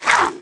Mutant Stab Attack; Valleakan Mutant 1; Aliens

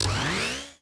servo, droids robots

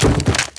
deadfall metal, foley

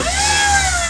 scissorlift start; Tony Hawk's Project 8; XBOX

